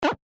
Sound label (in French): tir mechant; spaceinvaders